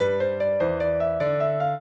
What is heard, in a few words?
piano; Mozart